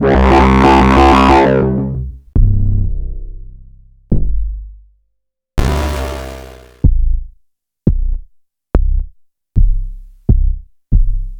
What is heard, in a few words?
Bass c